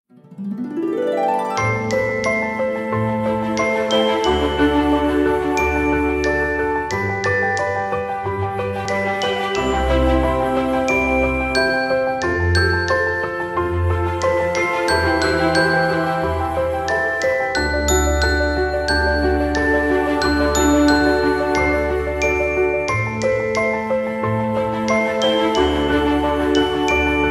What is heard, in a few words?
Magic Glockenspiel